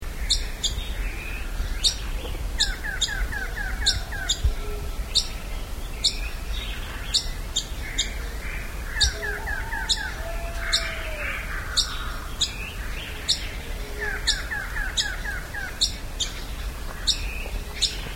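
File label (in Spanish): Mosqueta Común, Phylloscartes ventralis, Mottled-cheeked tyrannulet; cantos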